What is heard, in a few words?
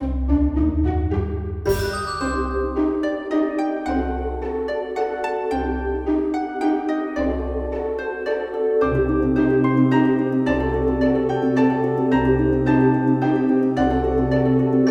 Orchestrated; Spooky Level